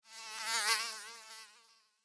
Mosquito Fly By, Animal, Mosquito, Fly, By, Wings, Insect, Bug; Mosquitoe; Mosquitoes; BlastWave